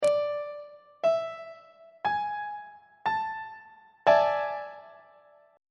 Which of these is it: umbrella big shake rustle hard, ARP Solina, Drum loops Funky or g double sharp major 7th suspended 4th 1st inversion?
g double sharp major 7th suspended 4th 1st inversion